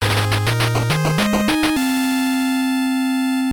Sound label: level clear; robocop